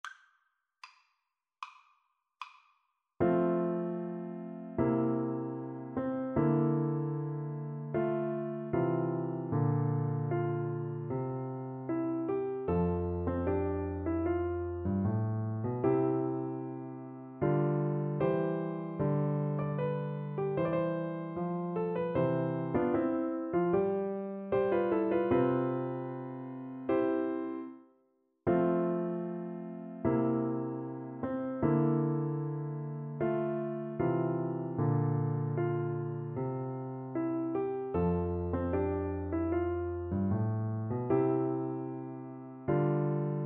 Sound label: march idomeneo act 3, clarinet; Mozart, Wolfgang Amadeus - March from Idomeneo, Act 3